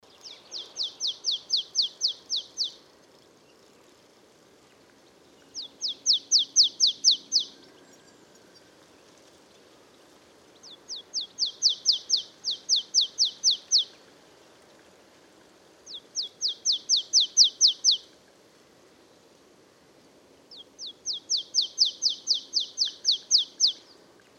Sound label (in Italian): Cincia bigia; valsolda